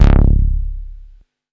Bass Juno C#; Vengeance Samples

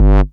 Bass Multi Rev G; Vengeance Samples

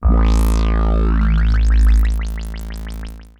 F A1, synth; Roland S100; musicradar-synth-samples; Roland F Multi

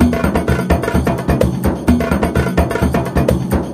Latin House Loop 128 BPM; Vengeance Samples

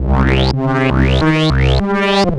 Roland C Line, Roland S100

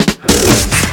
Loops Fillin140; Vengeance Samples; VEC1 Fills

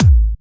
Bassdrums

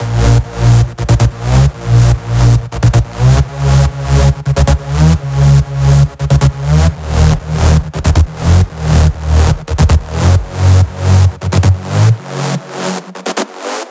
Bassline Big, 138 BPM; Vengeance Samples

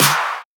Claps & Snares

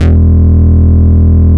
Root E, Bass

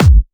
Bassdrums Clubby Kicks; Vengeance Samples

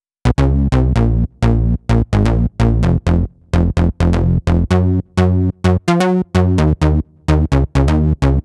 Female Bass, 128 BPM; Vengeance Samples; Vocal-Hook Kits; Song Kit; Keep Believing

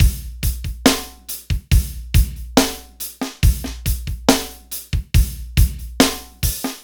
Slomo Beat 140 BPM

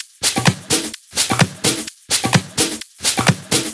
Percussive Loops 128BPM; Vengeance Samples

